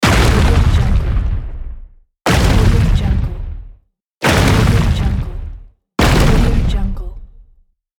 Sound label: asteroid explosion